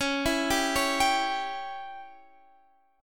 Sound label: Db Diminished Db down Guitar Standard; arpeggiated; JGuitar